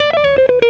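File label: electric guitar melody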